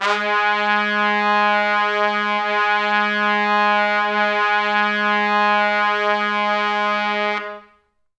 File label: Pop Brass, G#2; Best Service; ProSamples vol.19; AKAI